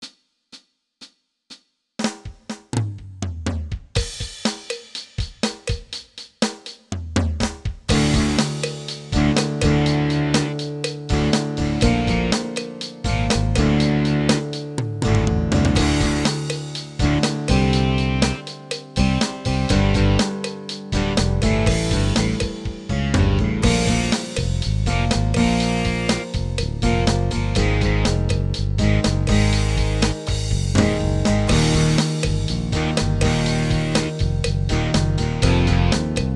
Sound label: rock power sync fluidr3; bandora creator